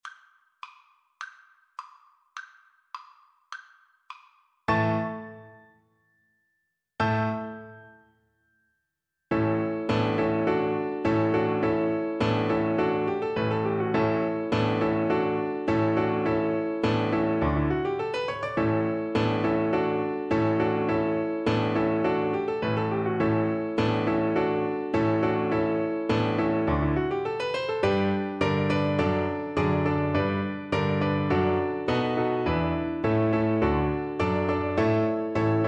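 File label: VLC karaoke, Cello; beethoven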